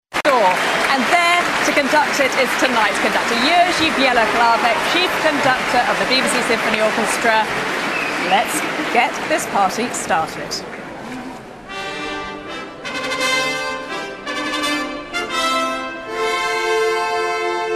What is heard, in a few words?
Fanfare